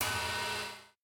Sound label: breakbeat